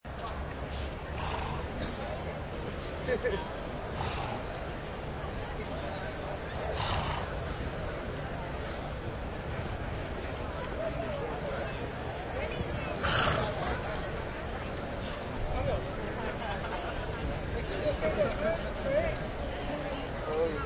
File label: Race Amb; Ambience of horse racing crowd, outdoors as a horse race is happening and play by play was being annouced; Crowds; Backgrounds; Crowd Sound Effects